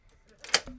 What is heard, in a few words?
door; 鍵を閉める音２